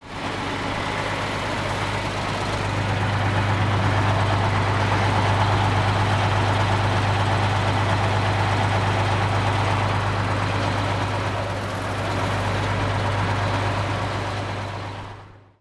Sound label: truck Idling; vehicles